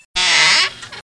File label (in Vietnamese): Tieng mo cua keu